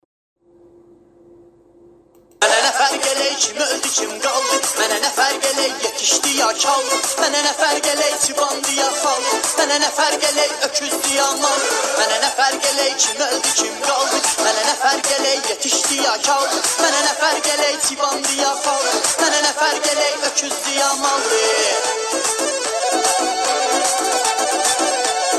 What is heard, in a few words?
new music tanze khandedar